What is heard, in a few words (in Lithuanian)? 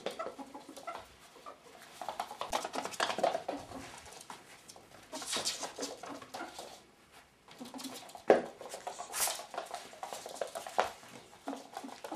gugavimo pavyzdziai begiojimas laminatu